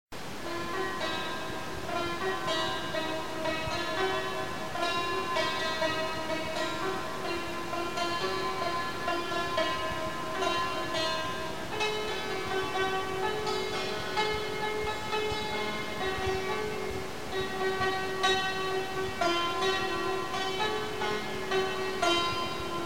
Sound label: santur solo